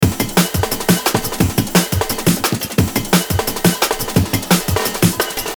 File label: Beat